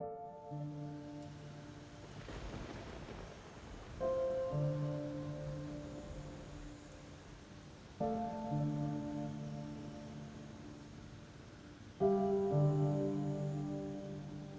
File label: Epic 120BPM, Cinematic Trailer Music; BlueFX; Epic Soundtracks